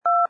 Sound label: DTMF 2; Samsung SCH-i539